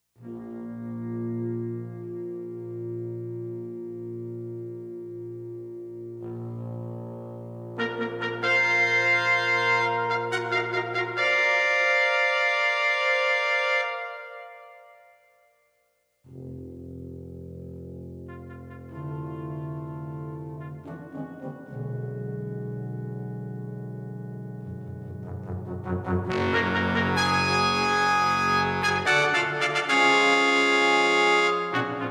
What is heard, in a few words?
Fanfare; Brass Choir